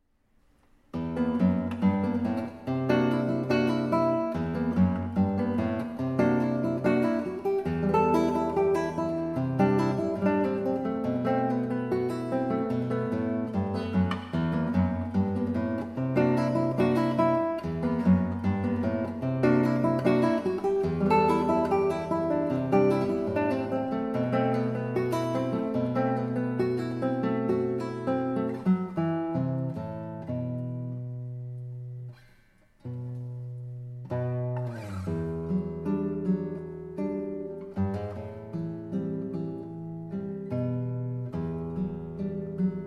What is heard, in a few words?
Tango in the Rain